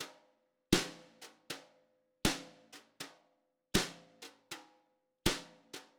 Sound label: Drums, Jazz